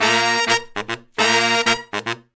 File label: Horn Section